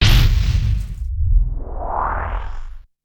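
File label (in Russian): взрыв бомбы с обратным эффектом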